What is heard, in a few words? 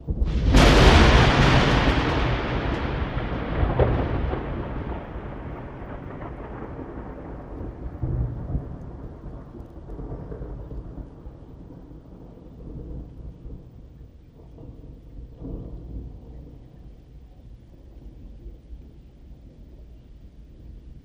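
Thunder Crack Strong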